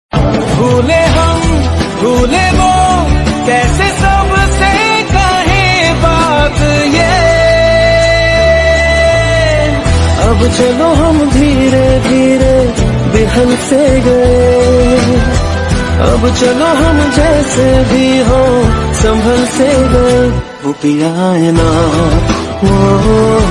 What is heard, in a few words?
sad ringtone